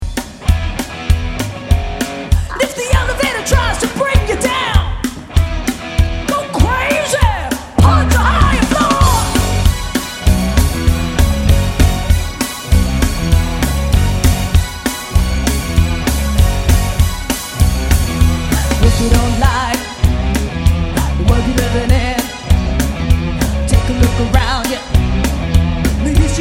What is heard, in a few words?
Cover Band